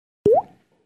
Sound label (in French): goutte